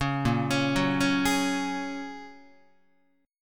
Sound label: A sharp Minor 7th Sharp 5th, Guitar; arpeggiated; 4A,4E,5A,5D,5G#,6C#; 4,6,4,4,5,7; JGuitar